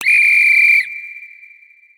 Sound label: sound machine sample; hof furni